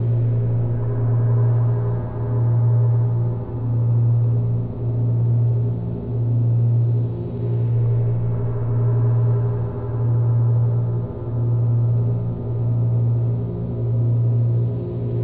Synth Loop Unknown Bpm

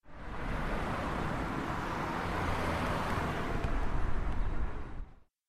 Passing Car

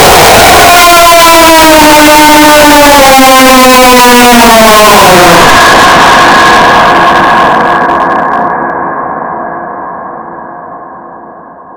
Loud Jumpscare

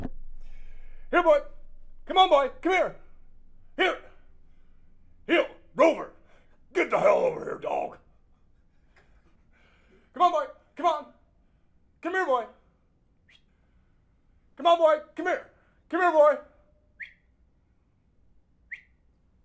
Dog call

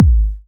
Kick Prog House Kick

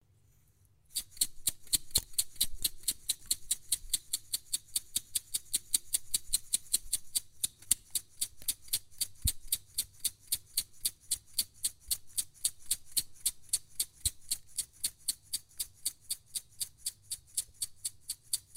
Salt

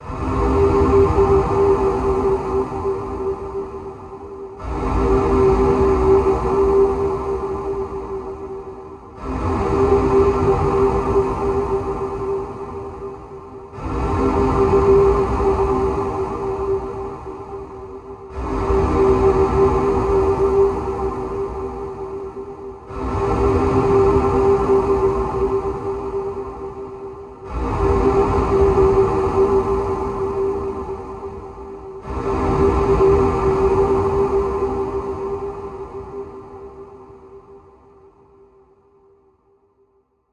Strange Big Factory

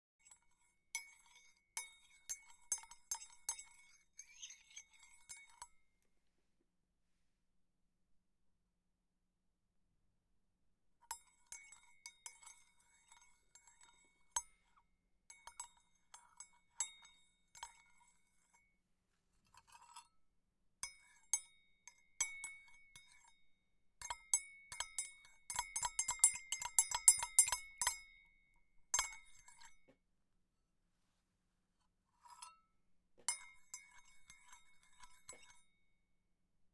Stirring Tea Cup

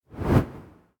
Whoosh Short

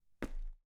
Step on wood

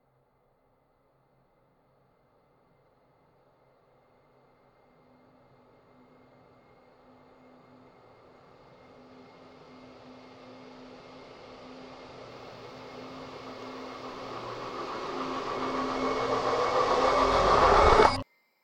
Horror Build Up; Malevolent Spirit

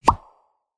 Bubble Bursting

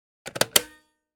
Polaroid One Step Camera Shutter

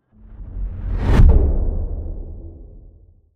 Cinematic Woosh

